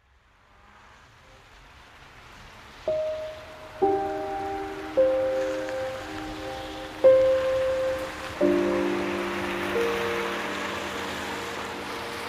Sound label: Dream Piano and Rain